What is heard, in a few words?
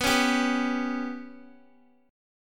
B Suspended 2nd Sharp 5th down Guitar; Bsus2#5 strummed; JGuitar